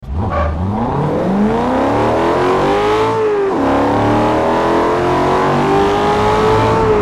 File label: first, vehicles; TDMCarpack; TDMCars